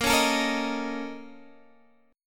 Bb Minor Major 7th Double Flat 5th, Guitar, Half Step Down; BbmM7bb5